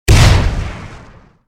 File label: bomb; fps3d